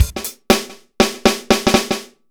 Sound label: dry loop, BPM120